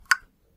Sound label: drip, Water; Foley; Kenney's Sound Pack